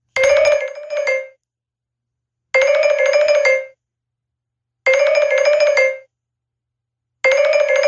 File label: ringtone